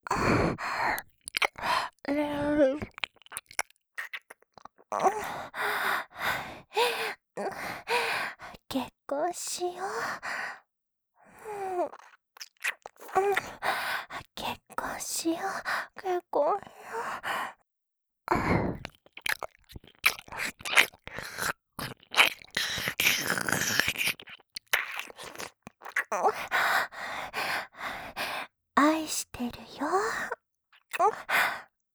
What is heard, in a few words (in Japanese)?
耳舐め 喘ぎ; シャルロッテ